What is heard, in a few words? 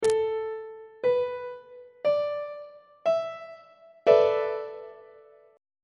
d double sharp dominant 7th suspended 4th 1st inversion; treble clef